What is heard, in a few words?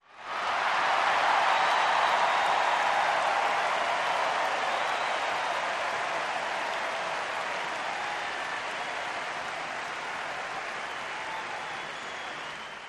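Crowd 50000 Whistle, large int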